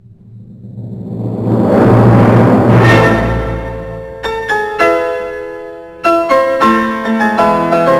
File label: piano